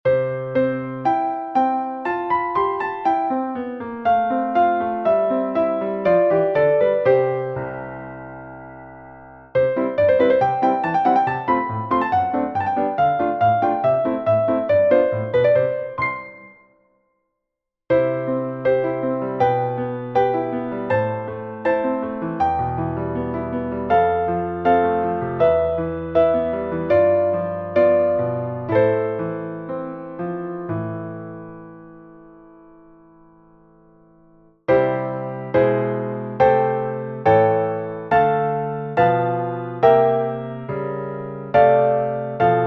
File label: twinkle twinkle little star very short piano